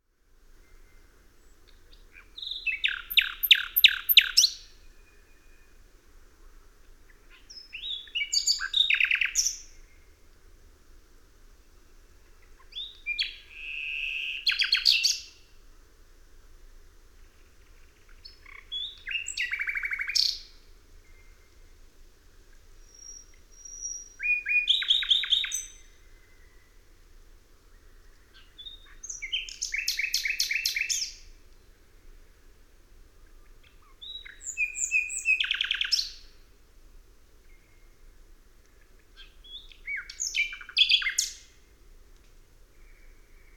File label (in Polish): slowik rdzawy